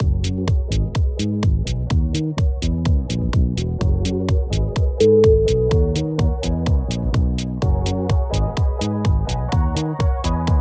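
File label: french house party, music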